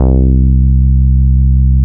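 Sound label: NORD F C3, bass; NORDY BS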